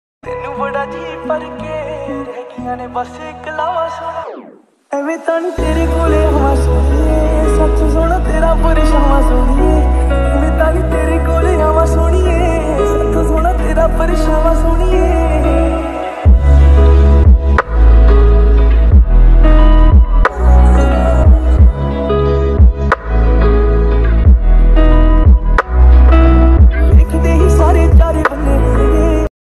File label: lofi remix ringtone sad ringtone